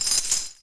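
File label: Chain Movement Short; Civ4 warlords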